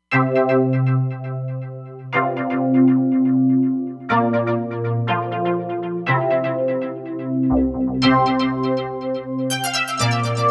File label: Roland Fantom XR